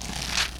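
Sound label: paper read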